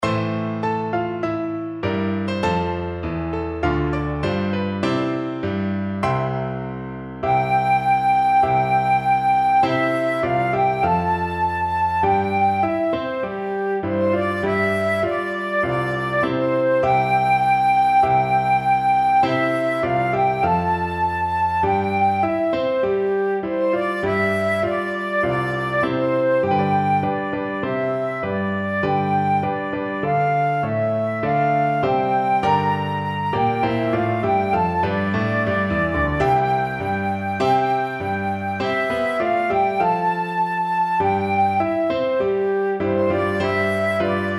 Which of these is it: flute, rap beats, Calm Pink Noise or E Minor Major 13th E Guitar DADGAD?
flute